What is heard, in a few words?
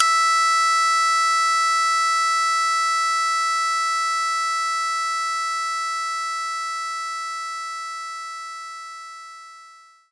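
Shamisen F#2; knights try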